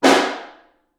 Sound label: Snare Far